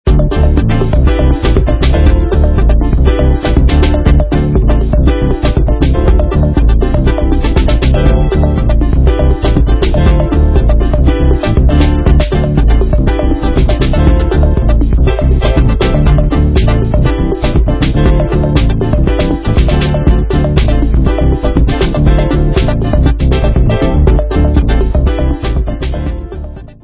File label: Technical Lo; moods